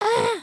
wave, emotes

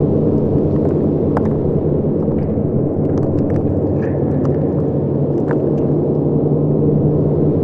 Creep Atmosphere; ambience

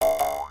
Victory Bouncing; emotes

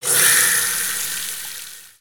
Water Fountain

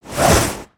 Spear Swing

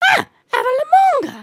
excited, emotes

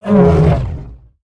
Disapprove, creatures pets

